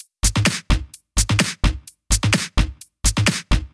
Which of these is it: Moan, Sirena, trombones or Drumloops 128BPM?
Drumloops 128BPM